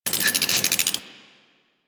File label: Tree Critter, Valley; Ambience; Warframe; Quests; The New War